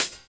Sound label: Hhat, HiHat; Equipped Music; Loungin House